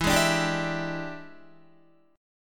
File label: E 7th Sharp 9th E down Guitar 4F 4A 5C 5G 5C 6E; E7#9 strummed